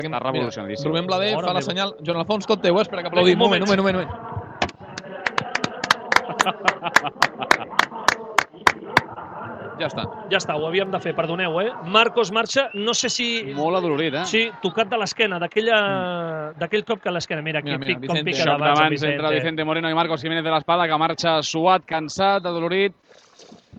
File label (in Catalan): aplausos